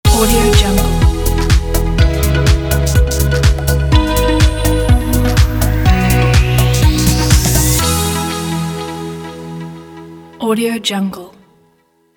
intro logo background; boxbeats

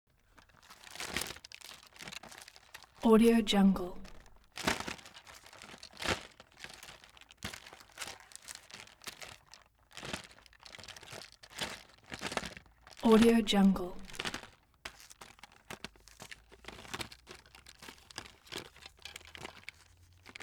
object food chocolate box handling; smartsoundfx

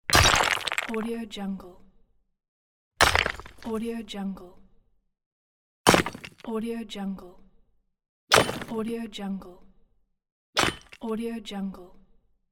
mining

